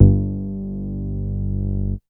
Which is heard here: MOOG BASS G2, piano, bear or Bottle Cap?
MOOG BASS G2